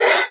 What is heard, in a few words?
sapper snap